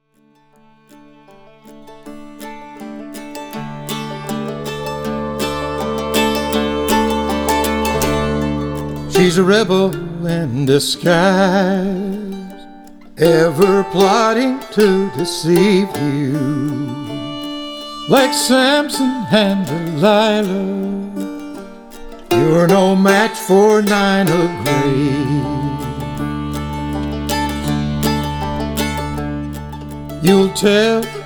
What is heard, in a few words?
Vocal